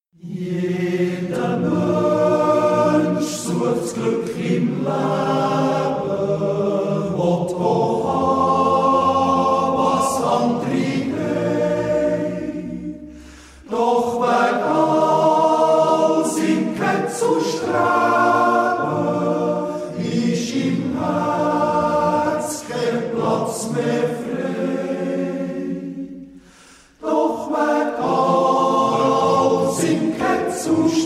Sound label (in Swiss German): s'Gkück im Läbe (Chor)